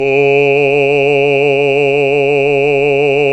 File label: BASS B, Roland; Sound & Vision - Gigapack II CD 1; GREG. BASSES